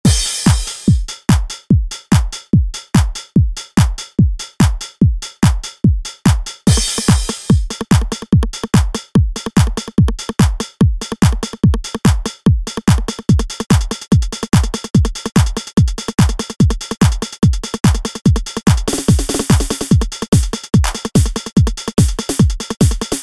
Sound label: d909 kit; superwave